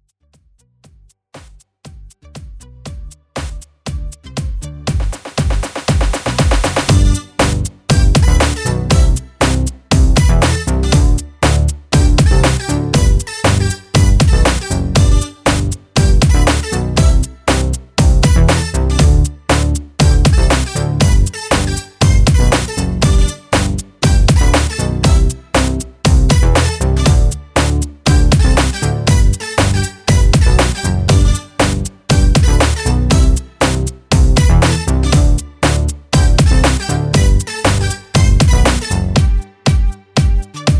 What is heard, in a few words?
Corporate Fun